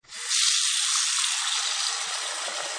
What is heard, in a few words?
water sound